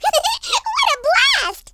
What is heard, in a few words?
laugh